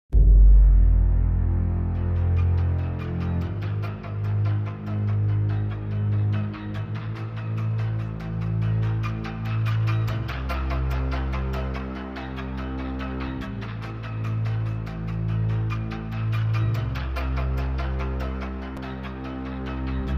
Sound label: orch twist danger